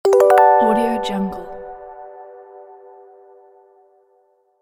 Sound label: notice signal